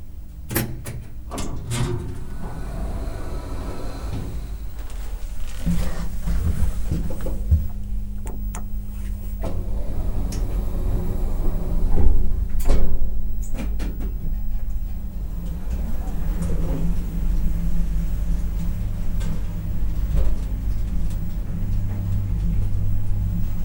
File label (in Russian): lift v gostinice